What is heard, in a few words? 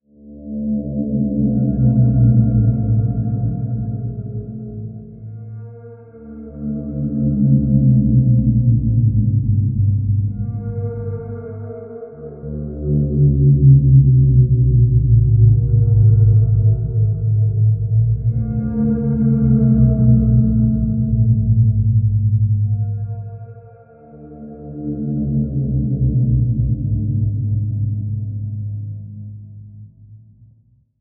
Dark Ambient Guitar Drone D Bb